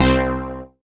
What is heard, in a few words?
Synth Bass; Vengeance Samples; VEE Synth Shots